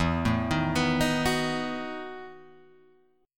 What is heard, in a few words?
E Major 7th Suspended 2nd Suspended 4th, Guitar, Open G